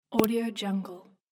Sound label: web menu